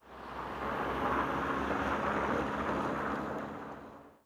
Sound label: office outside driveby, ambient atmosphere